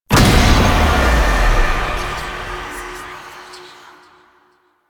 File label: Apparition Impact; Warframe; Dagath